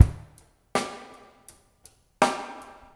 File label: RSS WET DR, Drums; Spectrasonics; Liquid Grooves 1 & 2